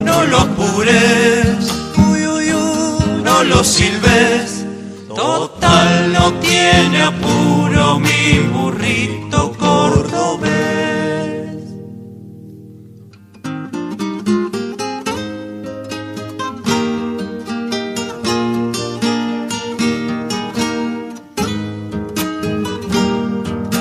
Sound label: folk instrumental